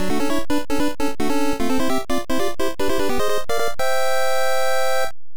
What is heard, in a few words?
All Stage Clear; Megaman 3